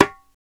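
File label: perc darabuka; Roland LCDP14 Africa VOL-2; Af. Hand Drm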